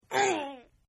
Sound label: Grunt; Grunts; Sound Effects:Voice; Partners In Rhyme